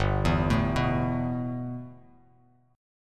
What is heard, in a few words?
G 6th Add 9th G Bass Standard, chord; G6add9 arpeggiated